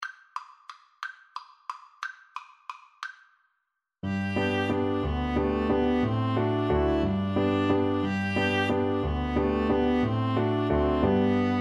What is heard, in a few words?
Violin-Viola Duet